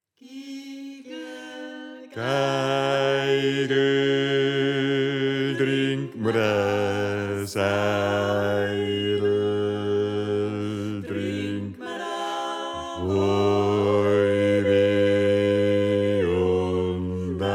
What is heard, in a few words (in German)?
Bass